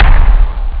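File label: funk bass drum; jazz jackrabbit 1; M8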